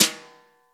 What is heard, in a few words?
BRUSHES, 12-Bit Drums; E-MU Formula 4000 Series Vol. 1; Hip Hop Nation